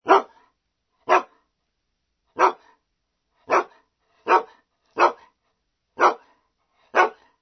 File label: ANIMAL DOG HUSKY